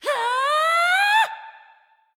battle cry

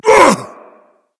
grunt hit berserk; cuchulainn

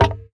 idle shell impact; smite; kuzenbo

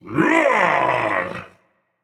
battle cry; smite; voicepack; ganesha

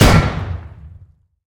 missile hit; smite; zhongkui

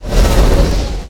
slither; smite; kukulkan

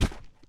footstep; smite; cerberus

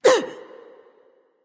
grunt hurt; smite; nemesis

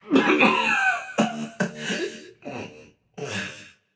clear throat, voicepack; smite; jorm

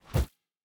staff spin; smite; ahpuch skin10

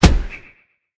hit arcane; smite; god merlin

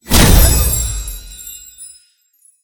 pwr fire; smite; tiamat

